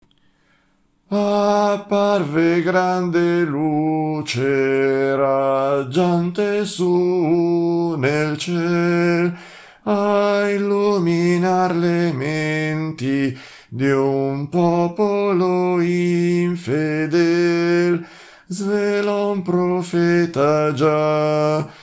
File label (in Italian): tenori